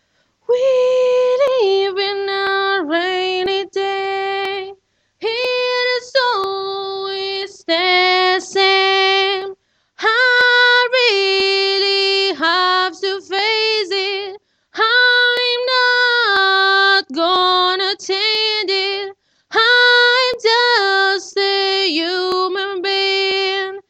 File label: Voice high pitched